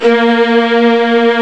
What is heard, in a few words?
Violas A#1